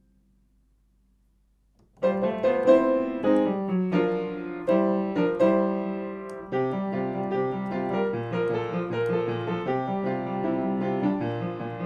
Fanfare

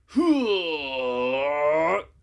Nailmaster Long Roar; Nailmaster Long Roar 02

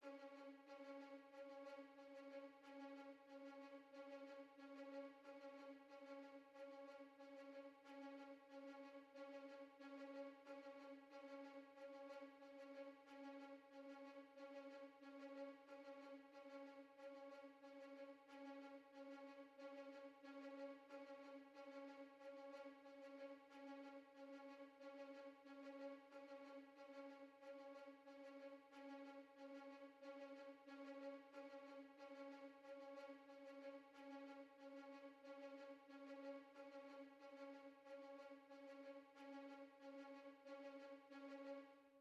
Violins Spi (D)

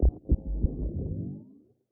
Haptic Teleport on; viewfinder

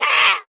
Attack

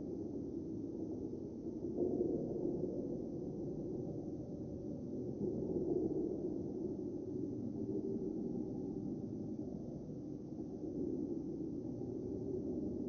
Hub-Train Tunnel Loop

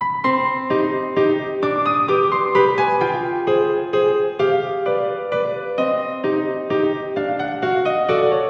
Piano Piece

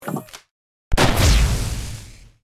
Skill Pierce; starbites

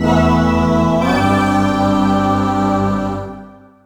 Jingle Level Event

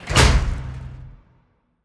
Sarco Slam

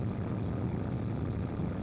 Mech Booster Loop; Starbites

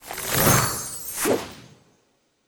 Yeti Ice Spell

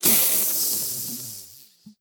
Vo Beast Stabbed Light

